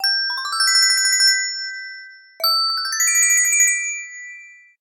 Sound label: radiant chimes; ringtones; Google; Google Sounds; Play It Loud